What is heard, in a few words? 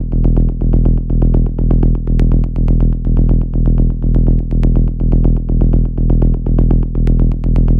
Bassloop 123BPM E